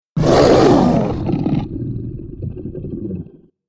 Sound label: Allosaurus Roar; dinosaurs